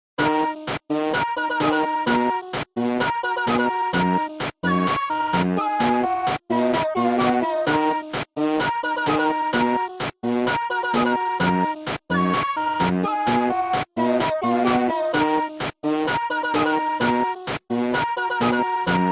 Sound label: Epic Sax; Pony Music Archive 19.03